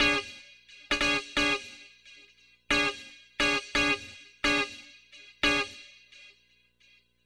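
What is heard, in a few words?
guitar, D minor, Hip Hop & RnB; ProSamples vol.34; Sweet Jasmin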